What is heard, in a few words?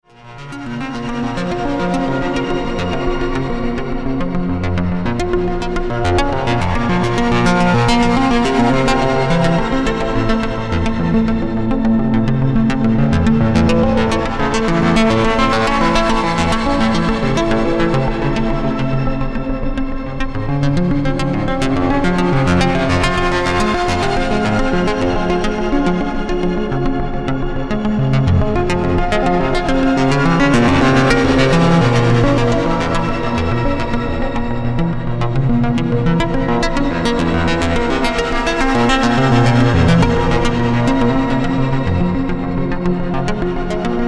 Karplus Strong Filter CV and LFO; StarLab